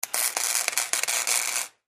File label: Arc Welder; arcs & sparks